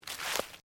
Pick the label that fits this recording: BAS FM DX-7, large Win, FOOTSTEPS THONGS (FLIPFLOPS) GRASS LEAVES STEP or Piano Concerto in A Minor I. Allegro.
FOOTSTEPS THONGS (FLIPFLOPS) GRASS LEAVES STEP